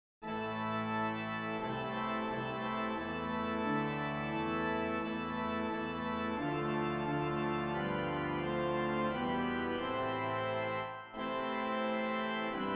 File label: Organ